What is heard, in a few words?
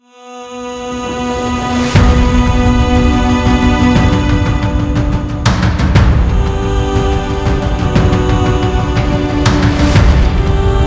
Strings; Tregenza; Era Modern Assassin Strings Platinum; Razer Phone 2